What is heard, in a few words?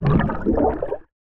underwater breathing